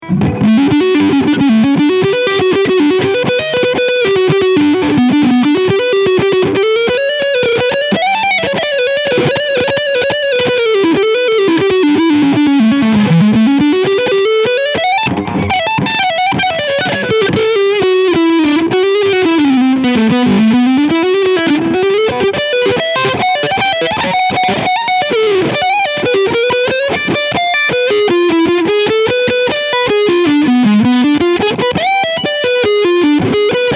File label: Harley Benton EX 76 Classic GHW AN Overdrive Leadsound Neck Pickup